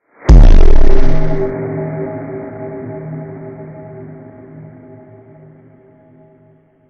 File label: Lose Stinger